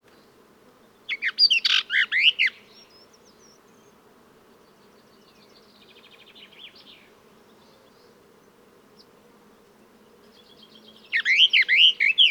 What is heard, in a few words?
sylvia hortensis song; passeriformes; sylviidae; birds of the ussr